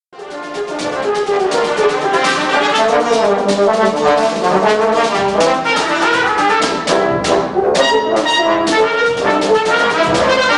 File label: Dance with percussion